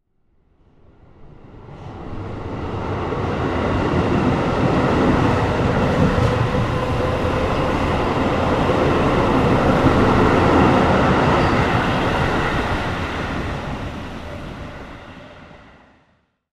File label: metro passing